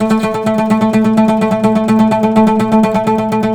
PLK OUD, Roland LA Composer

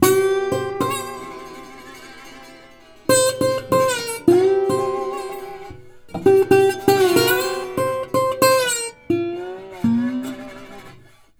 120, Blues Guitar; ILIO - Fretworks

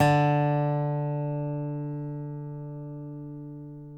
DOBSUSDOPN-L, dobro notes; Country

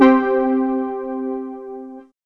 SYN ANA3; Best Service Gigapack 1

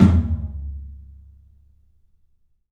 TOM, 3D Drums, CLUB TOM; 3D Sound; CLUB 14TOM

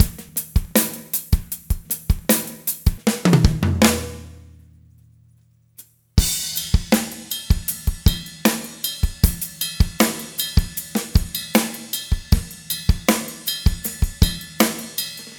rock drums stop, 78bpm; Quazibeat; Rock Heaven Drums

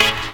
KEY, Synth FX Misc

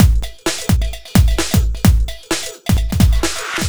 Tinny Break Full, Drum Loops 130bpm; Zero-G; Soundclash Nu Dance Electro; Acidized Wavs

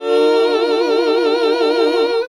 HAL GOSP., GOSP. MMMhh; Sound & Vision - Gigapack II CD 1 (Roland)

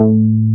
bass Croma A 3; Best Service Gigapack 2

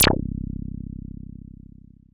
Synths BASS

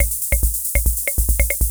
140 POPS; Best Service; XX Large Most Wanted; Sample CDs

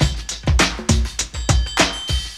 BEAT, Beats 101 bpm, Hip Hop; E-MU Formula 4000 Series Vol. 1; Hip Hop Nation

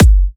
Electro Kicks

